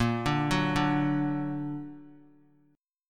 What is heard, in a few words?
Bb Augmented Bb; arpeggiated; JGuitar